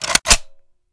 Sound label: reload empty fast charge; gmod